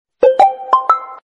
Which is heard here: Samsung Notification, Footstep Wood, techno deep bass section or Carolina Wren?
Samsung Notification